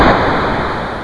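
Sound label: THE EXPLOSION; WAR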